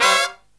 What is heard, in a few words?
HI CHORD, Brass